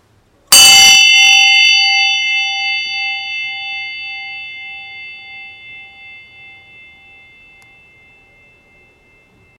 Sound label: Bronze Patio Bell